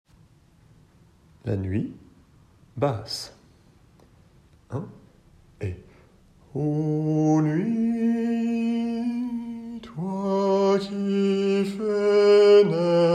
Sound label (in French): La nuit Basse; Rameau